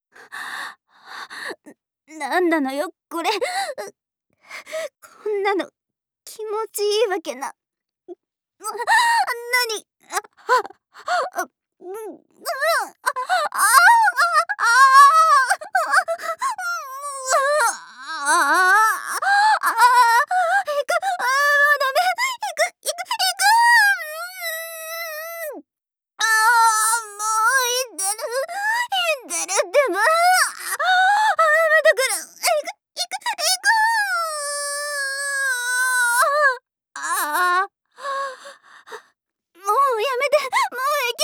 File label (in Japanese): あえぎ声